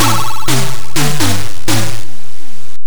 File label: Game Over; RoboCop. Game Over; CNGSoft